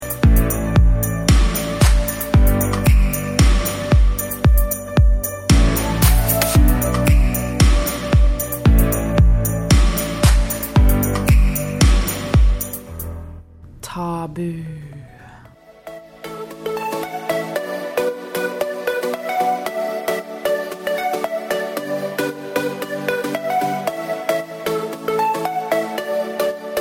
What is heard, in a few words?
jingle; TABU